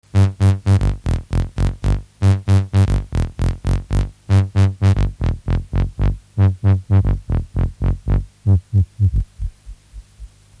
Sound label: KB-800 filter hack